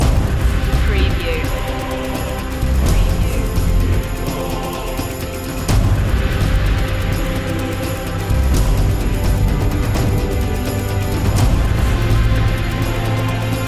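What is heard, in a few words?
background bed choir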